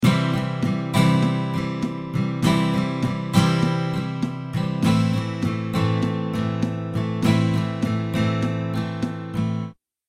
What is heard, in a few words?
strumming pattern